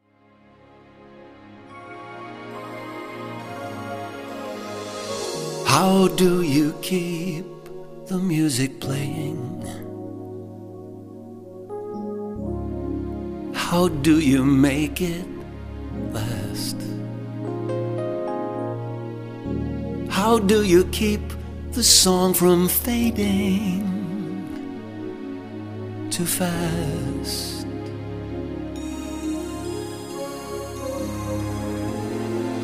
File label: MUSIC PLAYING